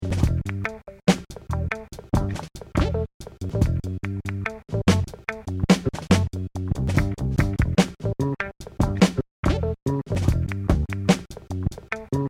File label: groove